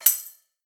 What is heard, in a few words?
percussion-sound